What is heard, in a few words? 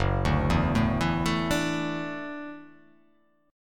F sharp Minor 7th Sharp 5th F sharp; arpeggiated; JGuitar